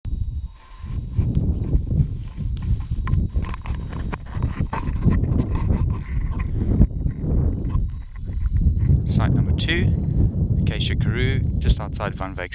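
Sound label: P. sp4 nr Van Wyk's Dorp, Platypleura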